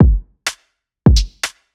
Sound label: beat